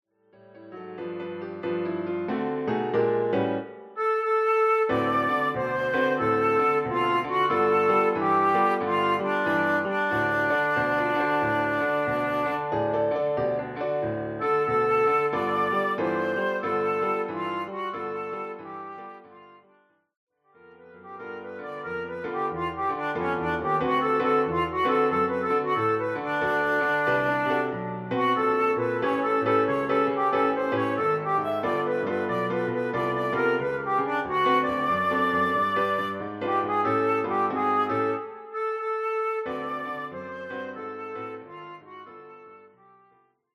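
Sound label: flute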